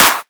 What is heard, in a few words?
Clap